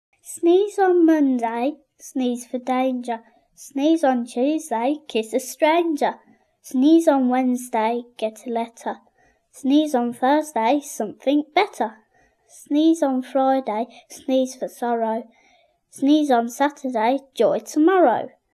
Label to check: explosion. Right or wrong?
Wrong